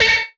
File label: Sci Fi Gun, Blast; Space Blasters2D